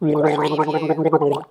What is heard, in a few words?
Gurgle, Man Gurgling, Medium Length Gurgle